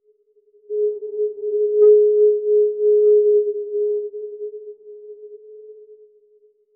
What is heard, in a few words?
spa